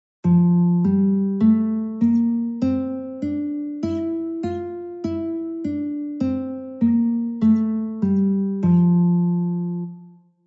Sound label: F Scale Guitar; California Music Conservatory